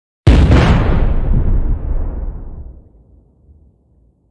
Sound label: reactor explosion; AA Dangerous Meltdown - EK Compatible